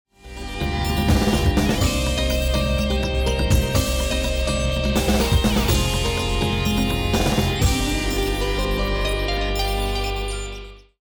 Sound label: bomb ten sec count; music kits custom